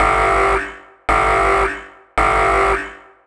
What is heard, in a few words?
siren